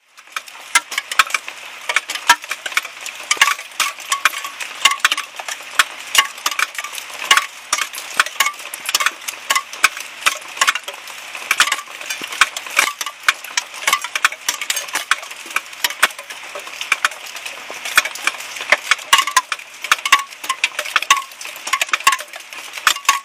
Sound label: koenji rain; field recordings; slater